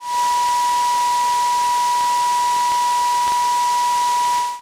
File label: 118 MHz 122 dBm